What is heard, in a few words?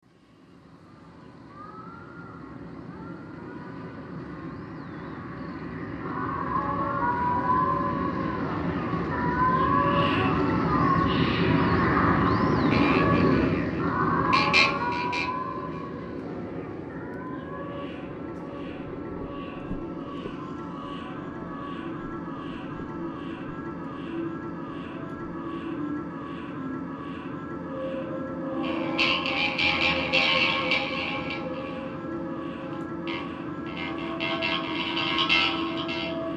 ocean